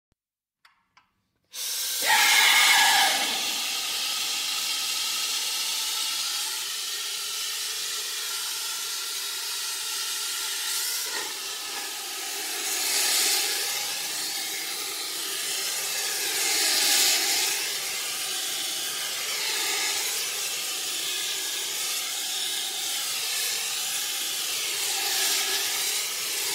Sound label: Fire Extinguisher